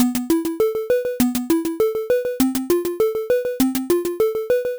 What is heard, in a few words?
Hovering Key; Adventure in the Tower of Flight